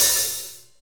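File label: hi-hat, Techno Rock; Northstar; Drumscapes Roland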